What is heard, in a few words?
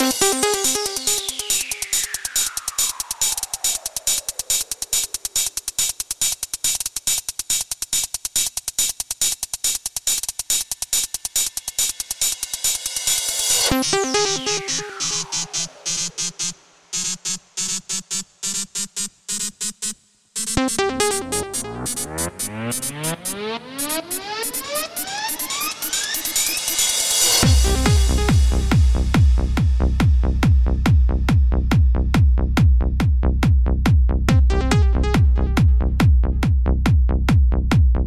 Trance City